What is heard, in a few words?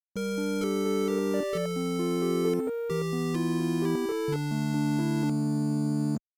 Arcade game 16 bit or 8 bit mission failed game over; Game Over Sound Effects